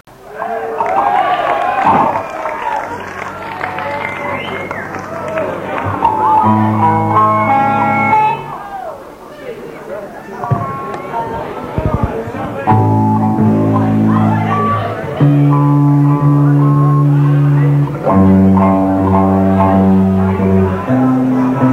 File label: tuning